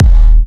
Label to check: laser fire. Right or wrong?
Wrong